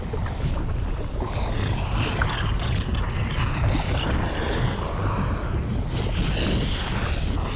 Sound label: LAVAFLOW; Crash Bandicoot The Wrath Of Cortex; XBOX